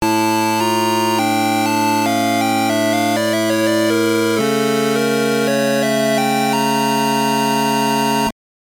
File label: Jingle, soundtrack; Uchuujin Tanaka Tarou de RPG Tsukuru GB2; GBC